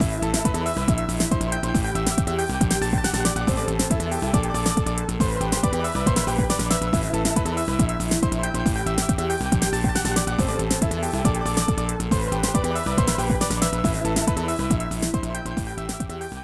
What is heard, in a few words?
Winning; marvel super heroes; arcade; PSYLOCKE